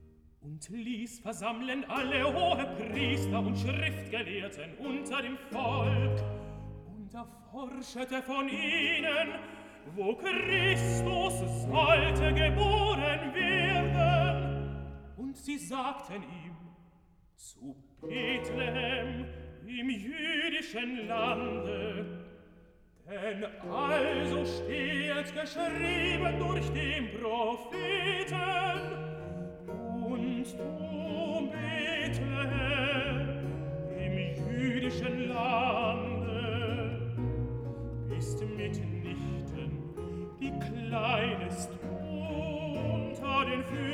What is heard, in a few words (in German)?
Recitative, tenor; Evangelist; Und liess versammeln alle Hohenpriester